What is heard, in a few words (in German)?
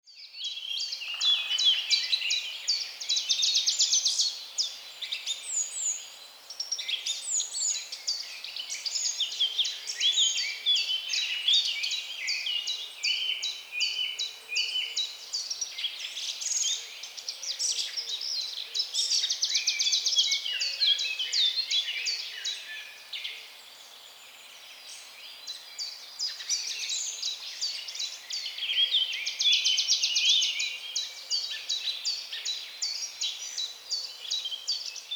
Naturklang der Singvoegel - Der Klang des Waldes; Entspannung fuer Koerper und Geist